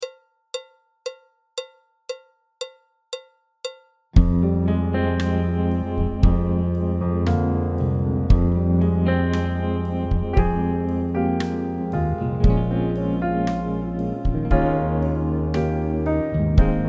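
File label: backing track, Jazz; alto, tenor, trumpet; Jazz Syllabus Level 2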